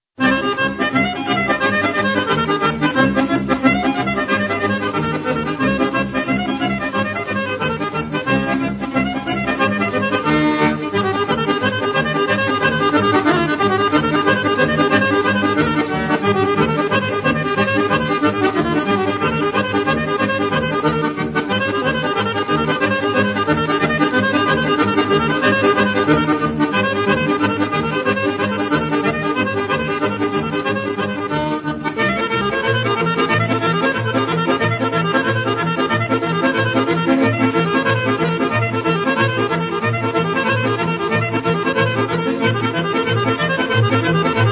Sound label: accordion